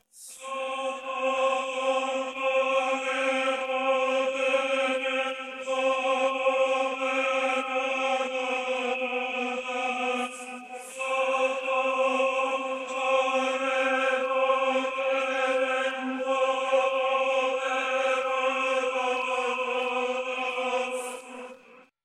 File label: X cheer